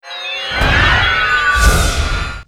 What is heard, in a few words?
Guard; Fly For Insanity